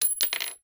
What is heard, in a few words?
cart